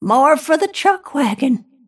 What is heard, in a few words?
last hit pain; snapfire